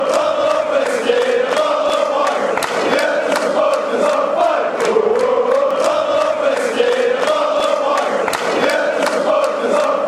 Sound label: a bottle of whiskey, chant